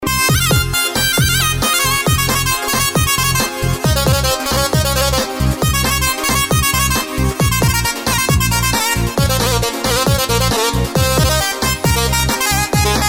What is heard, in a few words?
BASSU MANEA